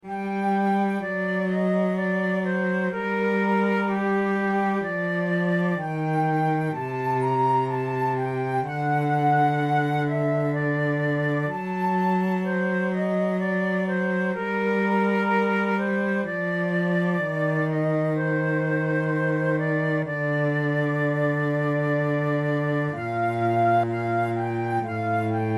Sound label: recorder sonata in G minor adagio; Marcello